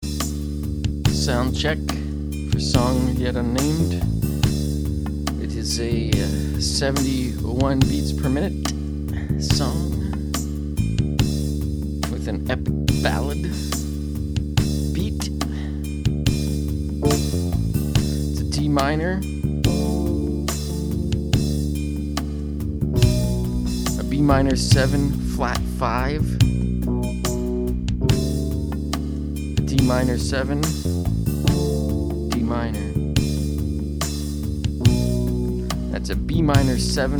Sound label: Soundcheck